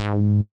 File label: G#3 bass